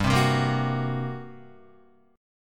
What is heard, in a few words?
G Minor Major 7th Double Flat 5th, G, Guitar; strummed